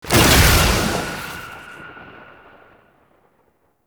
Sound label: Grimoire Interact Stinger; Enemies; Warframe; Entrati Doppelganger